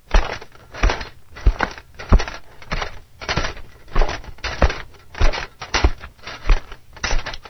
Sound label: Walk Armour